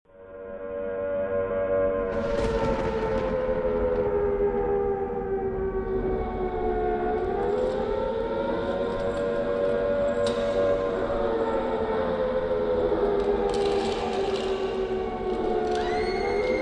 main ambience, zombie plague

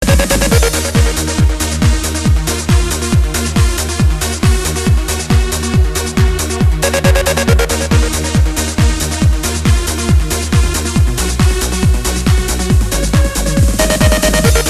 retro

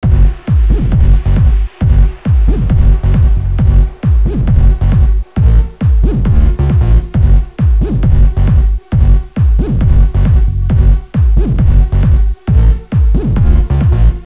music; zm oldstreet cso

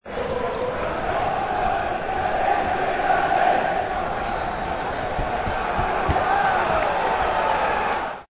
fan; jbextreme

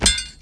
rope gun; cstrike; garg

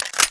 p90 clip in

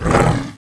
nemesis pain

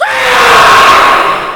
hunter attack mix; cstrike

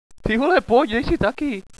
cough, hostage; cstrike

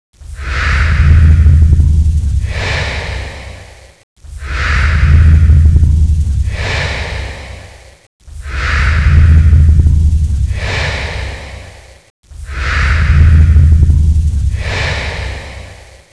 monsters idle; oblivion darkangels

